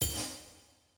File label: ui button cancel click; tft lny2022